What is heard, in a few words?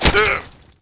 damage